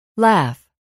laugh

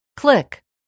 click

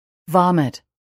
vomit

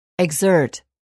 exert

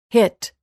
hit

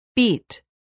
beat

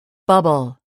bubble

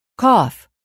cough

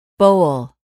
bowl

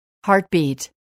heartbeat

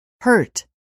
hurt

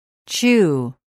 chew

